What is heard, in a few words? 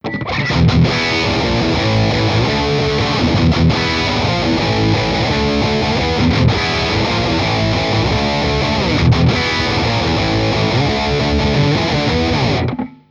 insane